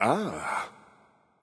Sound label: grunt; Smite; bacchus